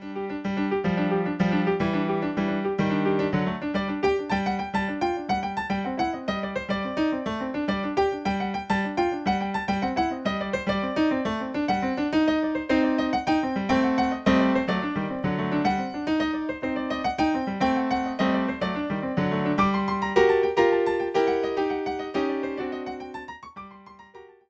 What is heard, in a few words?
Balkan Tunes